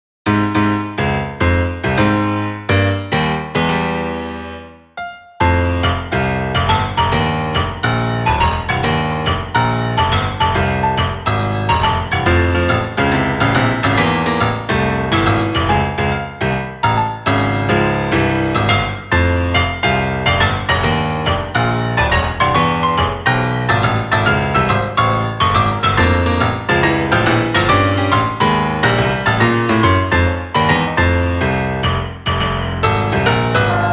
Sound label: piano instruments